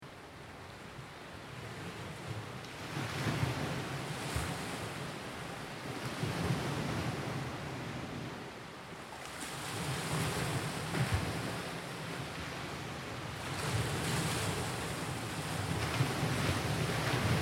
Smooth Ocean Surf Loop 30 Ft Distance, Sea waves washing in; on beach, recorded at 30 ft from shore